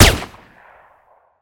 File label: weapon; ZOOTIEZ